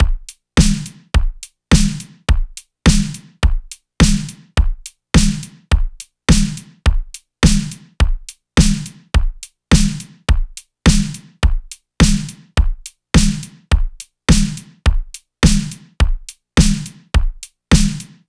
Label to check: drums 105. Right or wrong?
Right